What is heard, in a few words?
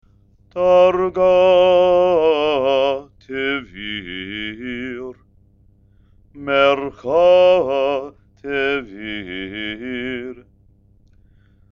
haftarah, trope